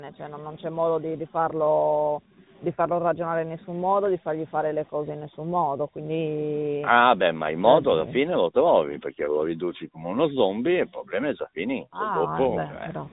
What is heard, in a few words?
zombie